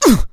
Inmate Fight Exertion; Prison Architect

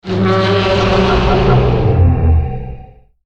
Boss Arrival; Sfx Boss Hokmuto Arrival; Godzilla Smash3

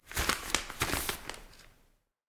Prisoner Page Turn; Prison Architect

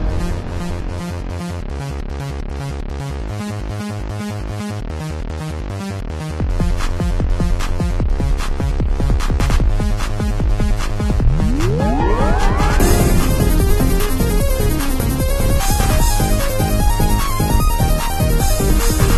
Twilight Techno; trigonometry dash online

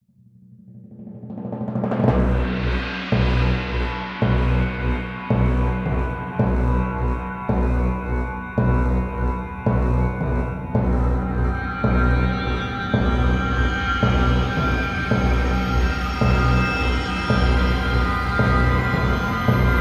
Music - Tense - Death March; Dungeon Munchies; ps4; Purple Planet Music

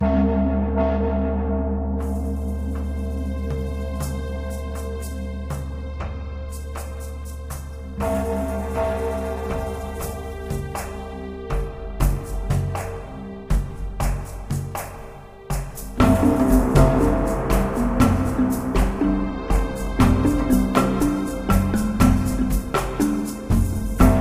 Music Ethiopia Fast

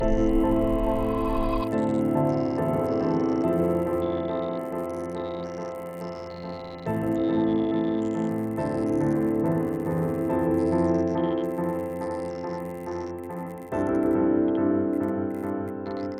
Trainride Stationary Slow; rive wreck hack die retry; ps4

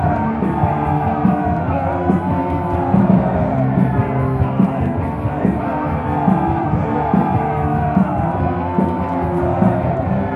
Rock Club

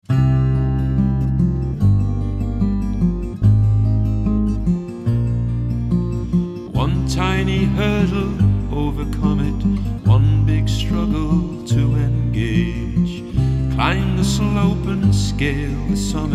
great success; Factotum 90; PS Vita gamerip 2017

